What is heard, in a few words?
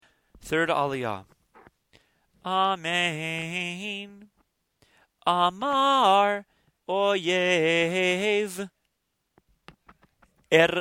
Beshalakh Chanted